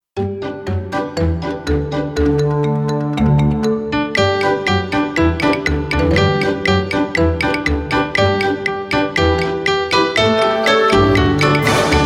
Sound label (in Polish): Zimowa jazda wersja instrumentalna; Genre: Blues; Ośrodek Rozwoju Edukacji